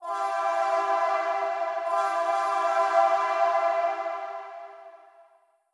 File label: trainhorn